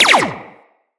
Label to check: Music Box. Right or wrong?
Wrong